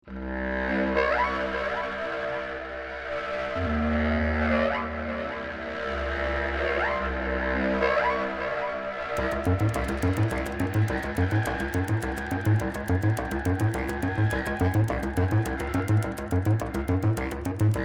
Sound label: clarinet; chieftan; complete extended techniques collection; elastic sounds